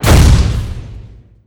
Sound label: Gun Assault Rifle Power Shot, Weapons